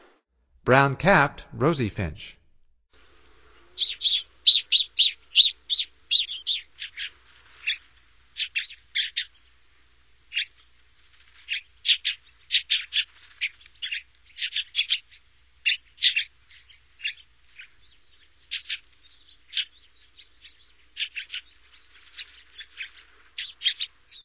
Brown-capped Rosy-Finch, Songs of Birds of North America; Stokes